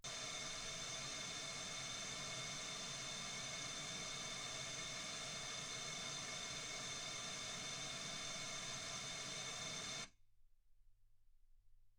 wgn

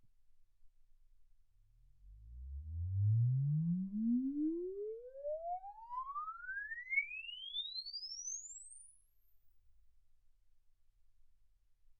exp swept sinus 10Hz 24kHz

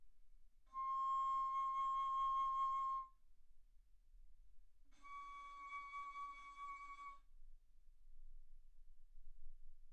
Bass Flute ff C6 Db6

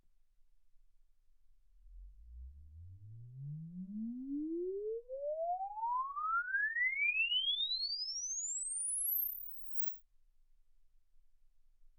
exp swept sinus 10Hz 24kHz

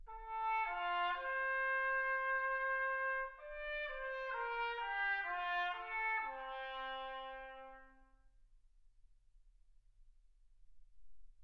trumpet

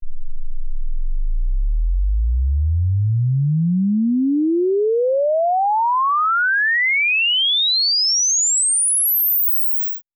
exp swept sinus 10Hz 24kHz

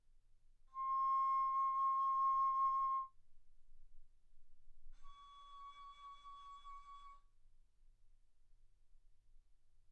Bass Flute C6 Db6; ff

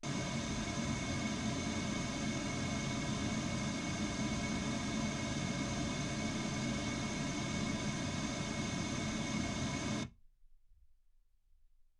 pink noise 48kHz

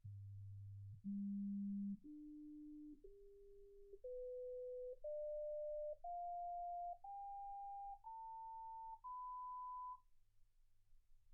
sinus tones 48kHz

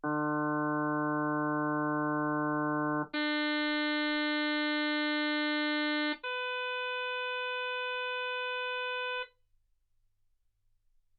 harm sinus 48kHz

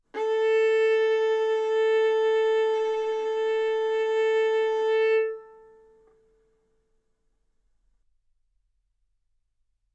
Violin arco A4 B4; mf; sul A; stereo

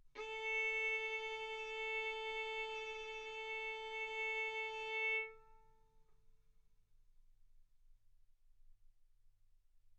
Violin arco mf sul A A4 B4 stereo

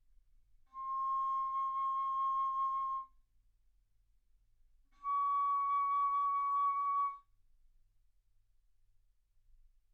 Bass Flute C6 Db6; ff

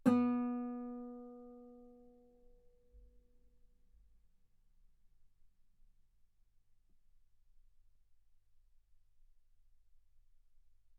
Guitar B3; ff; sul B

